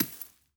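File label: bullet case bounce 556 sand; gmod; viper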